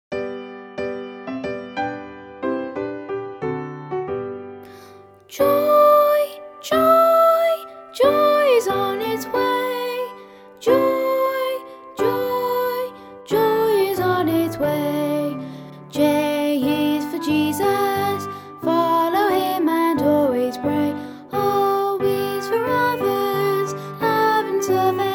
vocal

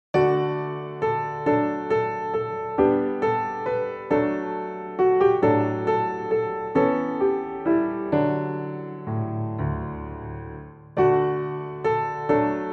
The Light of the World, piano

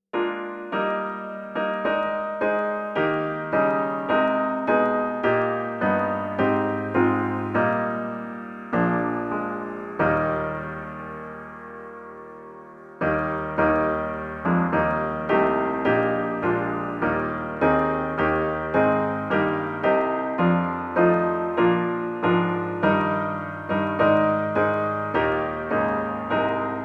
God's Faithful Promises Are Sure, piano

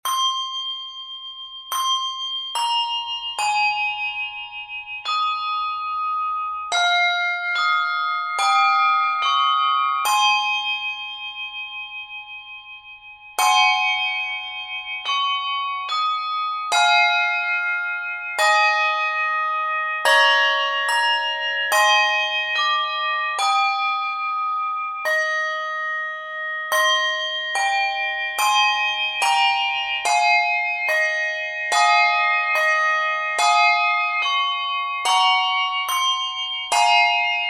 handbells, 2 octaves; Abide With Me

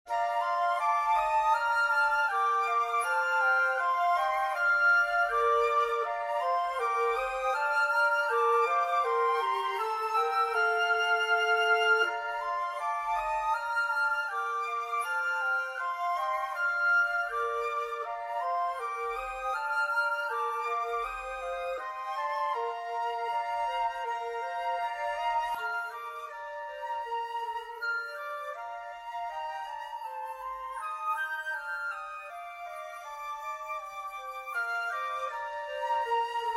Winter Medley, Flute, B-Flat Major

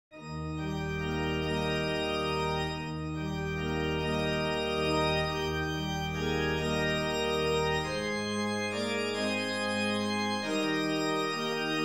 Sweet Hour Of Prayer organ

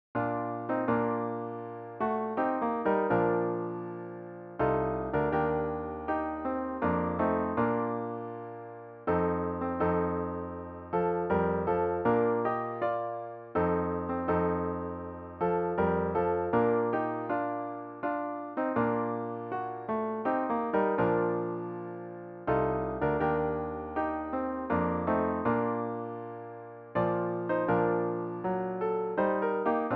organ; Jesus, Savior, Pilot Me

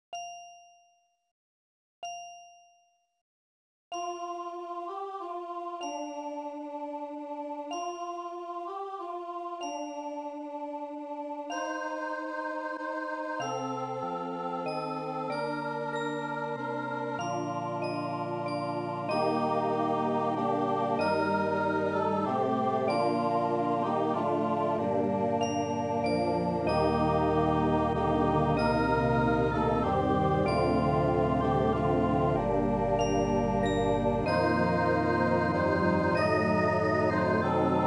Silent Night, SATB, bells, organ; The Promise of Bethlehem

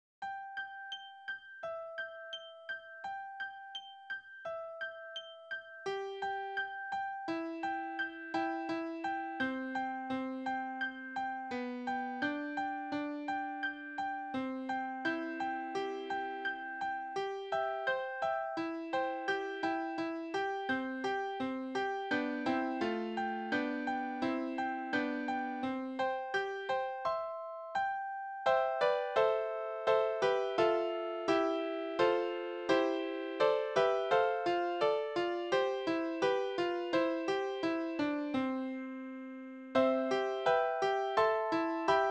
Give Said the Little Stream easy piano; Hymn Arrangements for the LDS Pianist

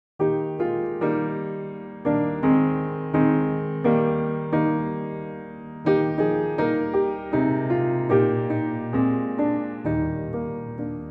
piano; Be Not Weary in Well-Doing

